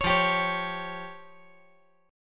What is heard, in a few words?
F Suspended 2nd Sharp 5th